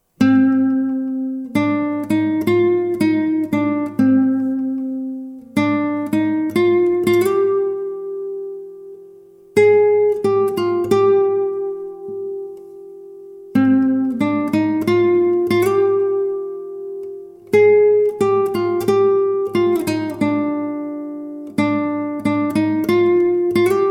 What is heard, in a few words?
Solo, guitar